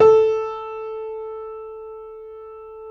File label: JAZZ A3, Keyboards Acoustic; USB Soundscan vol.17